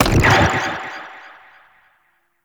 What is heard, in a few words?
Passive Revive Buff End; Warframe; MonkeyKing